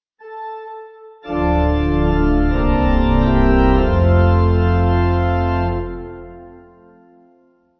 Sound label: Pipe; Gospel